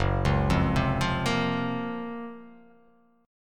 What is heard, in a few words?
F sharp Major Sharp 11th F sharp; arpeggiated; JGuitar